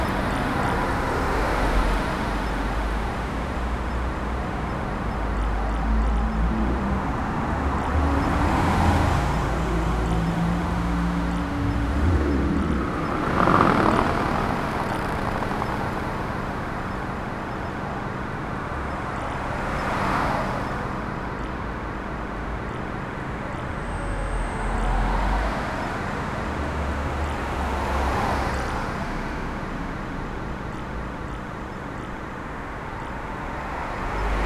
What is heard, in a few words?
city traffic ambience